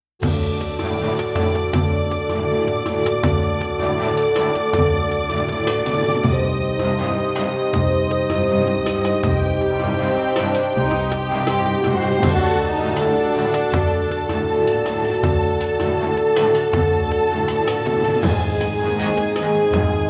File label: Mid East Victory